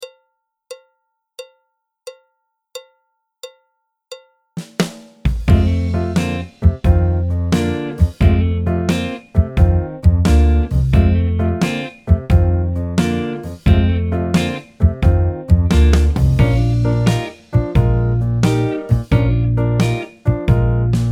clarinet, flute, tenor; Jazz Syllabus Level 3

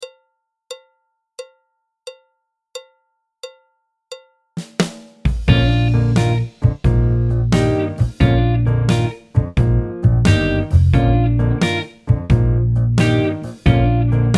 Payday BT, alto, trumpet, trombone; Jazz Syllabus Level 3

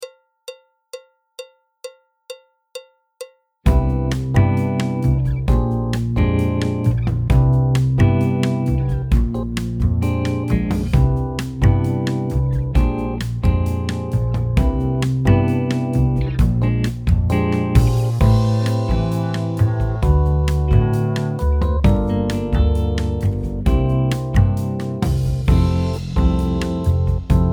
Easing BT, clarinet, flute; Jazz Syllabus Level 3

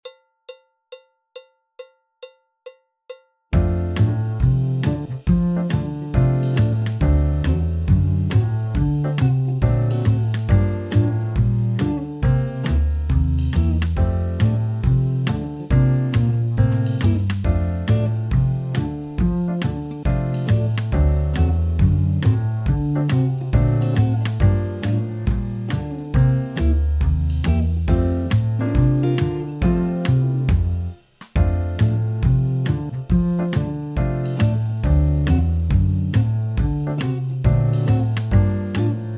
Ambling Man BT (trombone) Extended; Jazz Syllabus Level 3

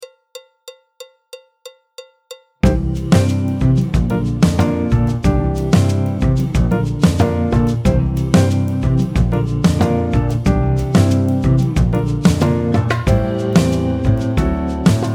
clarinet, flute, trumpet; Jazz Syllabus Level 2

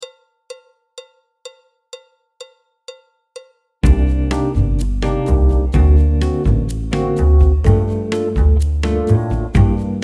Late Night BT (clarinet); Jazz Syllabus Level 1

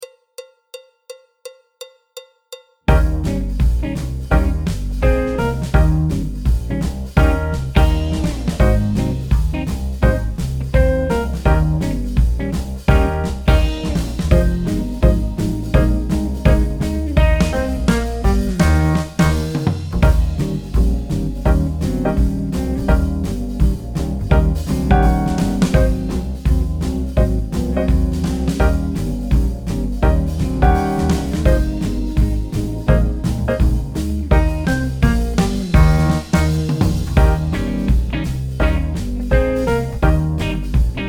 Unicycle Blues BT, trumpet; Jazz Syllabus Level 1

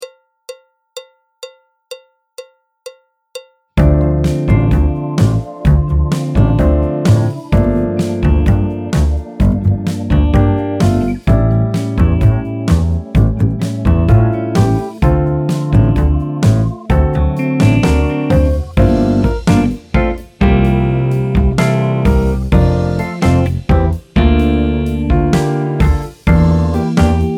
Barbican Tango BT, piano; Jazz Syllabus Level 3

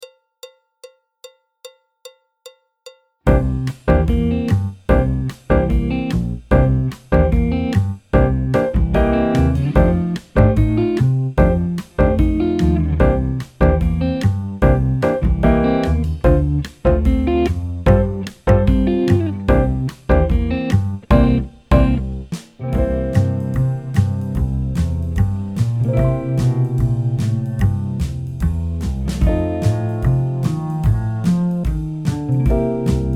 trumpet, trombone; Jazz Syllabus Level 2

